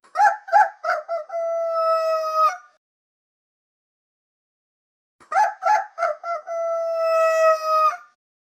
short dry repetitive croa, croak

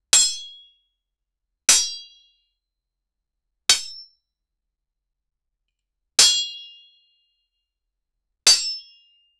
melee sword block impact; combat

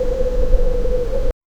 establish cold outdoor wi, blizzard

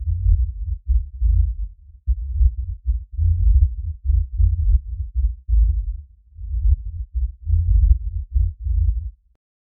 deep rhythmic bass

